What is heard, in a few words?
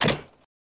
Latch Servo End